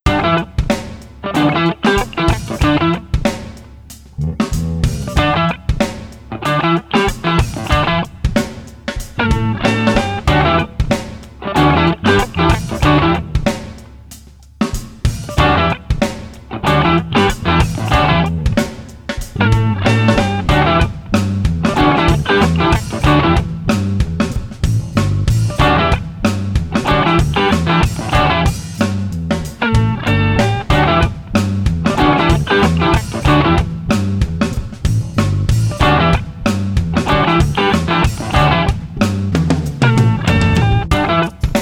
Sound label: Street Guitars Funk Steady 94